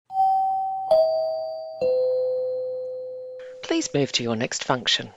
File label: three chimes descending please move to your next function